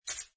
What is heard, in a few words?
small win; ThreeHeadedDragon